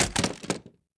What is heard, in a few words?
mag drop, weapons, glock17; garrysmod